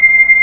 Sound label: SPACE GLASS, PAD